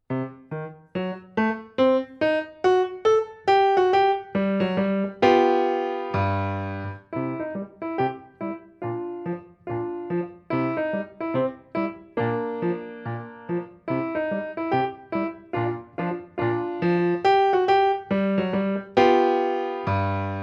Ragtime Do Si Do

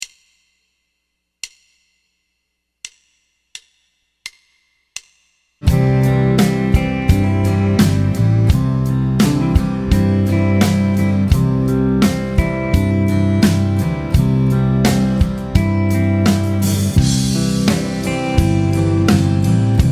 Triad Vamp MAJ; Triad Vamp C Major; Piano Practice Tracks

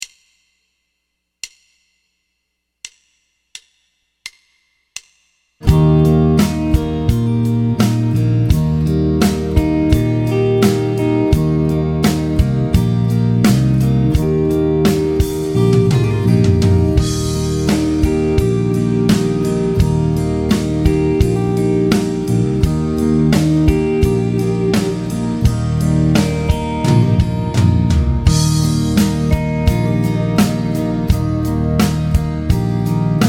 Triad Vamp MAJ Eb; Triad Vamp Eb Major; Piano Practice Tracks

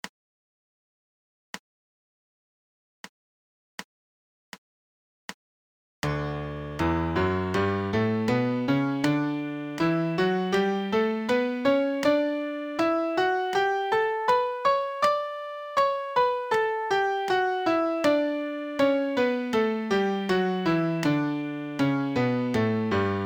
MIDI Major Scale D; Piano Practice Tracks

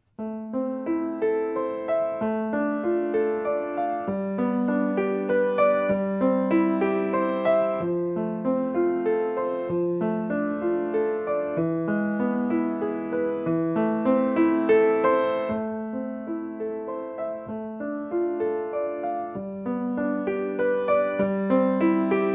The Harp

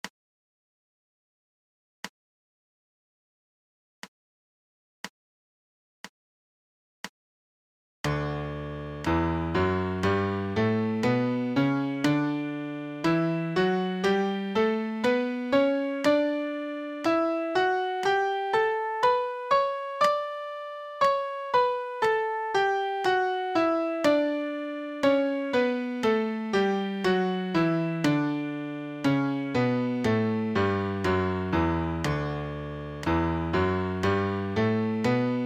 MIDI Major Scale D; Piano Practice Tracks

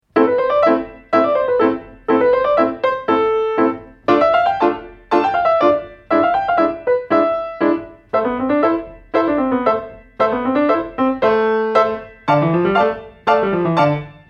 Etude Allegro

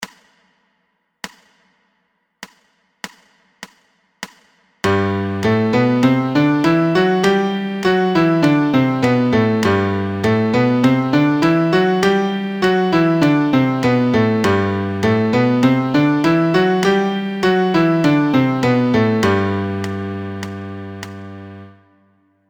MIDI Major Scale G 100

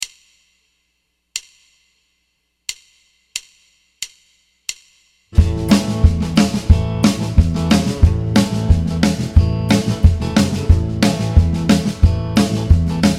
Guitar Vamp A

